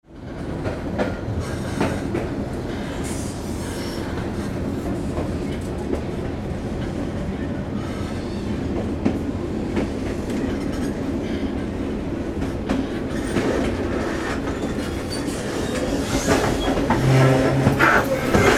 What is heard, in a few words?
train cabin inside wheels squeaking noisy